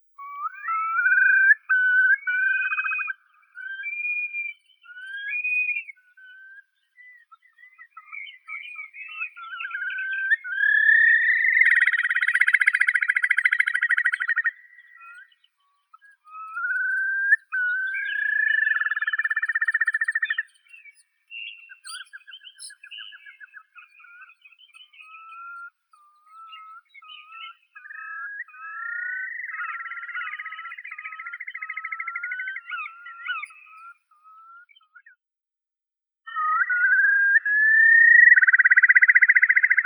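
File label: whimbrel, Numenius phaeopus; kronshnep srednii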